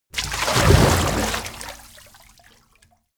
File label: splash strong, Badezimmer; haus garten